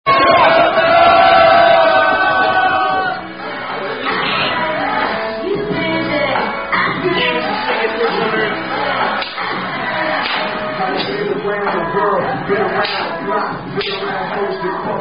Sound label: Drinking Game; Thumper In Tremblant